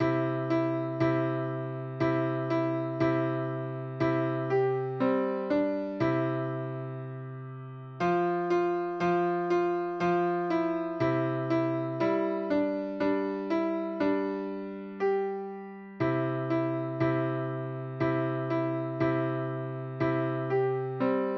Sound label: jingle bells, piano